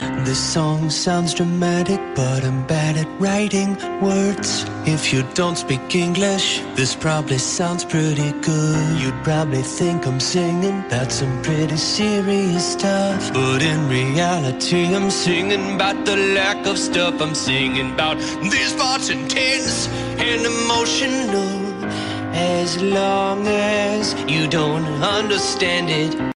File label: Dramatic Song